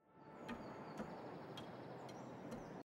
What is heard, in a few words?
walk cycle metal; gris